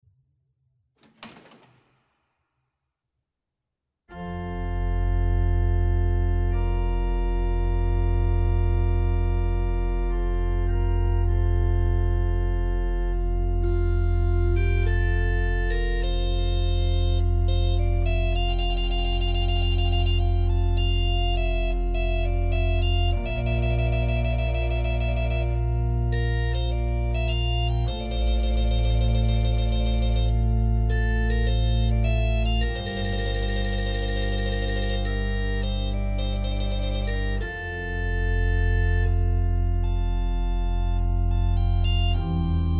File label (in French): Elevation Fa solo Cornemuse puis Clochettes; Zipoli